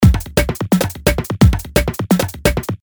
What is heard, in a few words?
Drum Loop